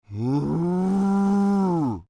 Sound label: Creature, Human, Moan, Med; Groaning; Sound Effects:Voice; Big Room Sound